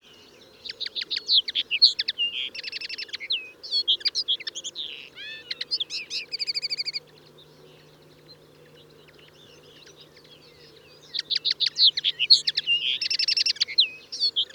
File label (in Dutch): Kneu Linaria cannabina